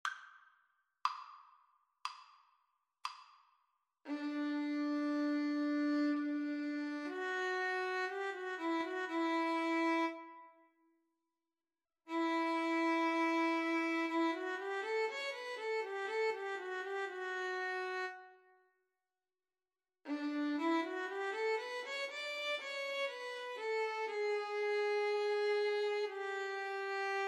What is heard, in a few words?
Violin Viola Duet